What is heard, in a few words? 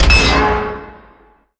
noise hazard wheel; world misc